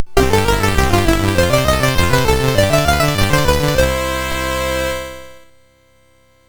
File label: sid MOS6581R4; Bomb Jack II